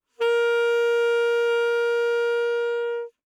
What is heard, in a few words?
Alto Sax No Vib ff Bb4, Eb Alto Saxophone; Woodwinds; MIS Pitches - 2014